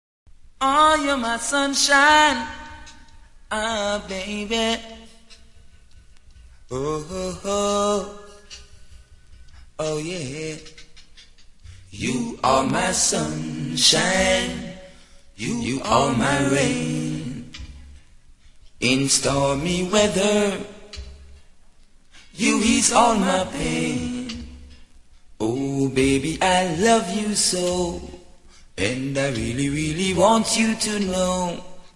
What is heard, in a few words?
Acapella